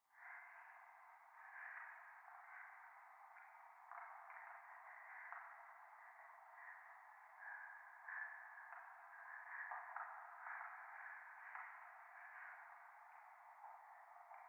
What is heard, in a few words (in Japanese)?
廃墟 右耳快感吐息 電車